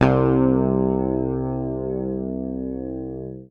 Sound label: PICK, Bass Library